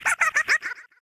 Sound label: gekko laugh